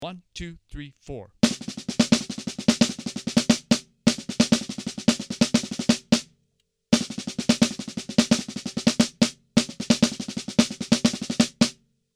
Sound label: Melody Snare